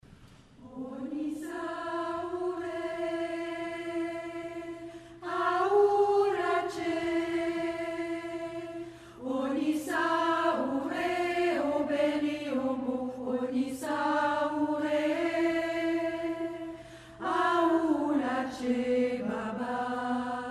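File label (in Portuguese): Canto de Candomble; culte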